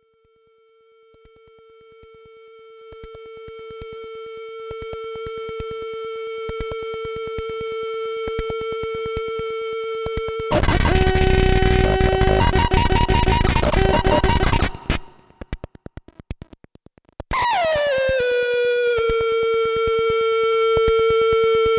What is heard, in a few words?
cluster drum